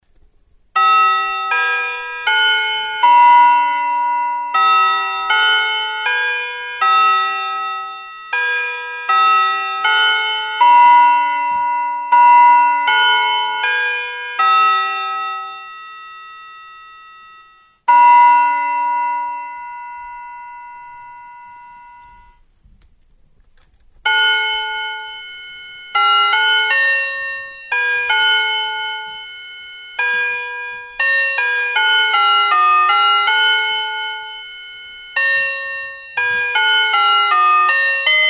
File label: bell